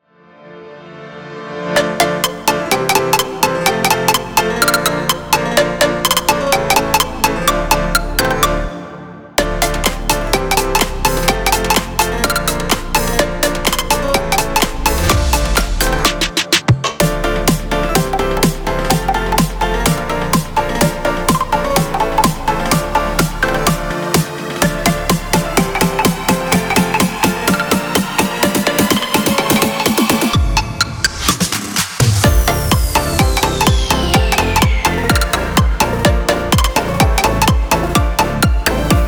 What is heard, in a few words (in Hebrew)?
פרוגרסיב האוס